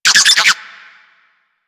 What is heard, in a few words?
Infested Minion Bark, Vocals; Warframe